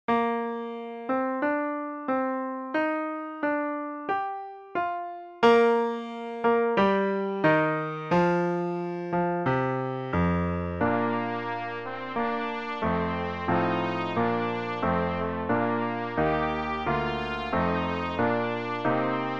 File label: hymn for trumpet, trumpet and piano; emperors hymn